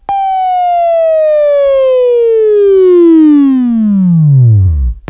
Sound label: Buzz; Labview Program; Sweeping Training program